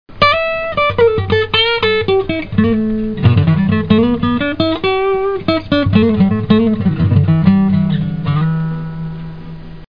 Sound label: Sound Blues